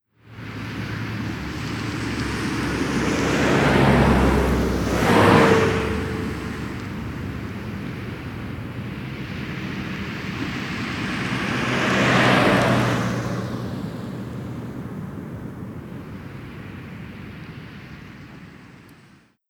vegas Traffic

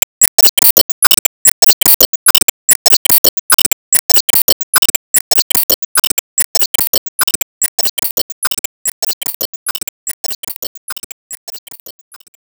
Enemy Hourgrass Active Loop

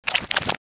death reverse; SFX Monsters

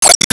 Enemy Rose Queen Active Fence Hit

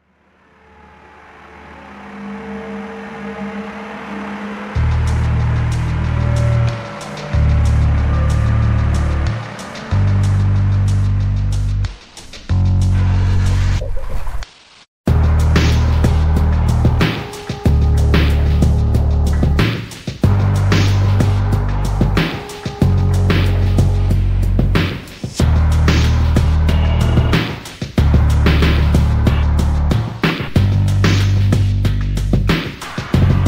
Pipewords Entry; White Knuckle

